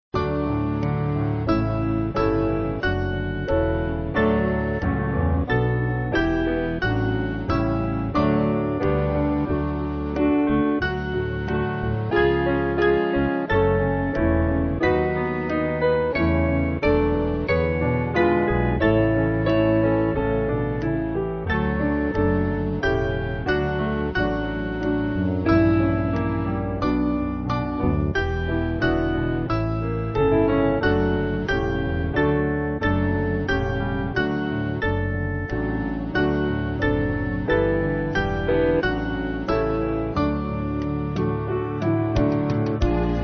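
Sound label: Band, Music Backing Files for Churches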